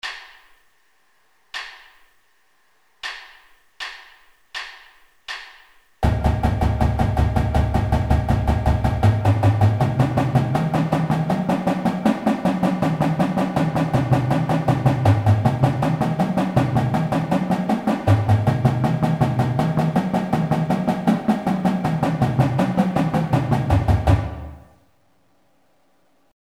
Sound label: legato slow, marching drum warm-ups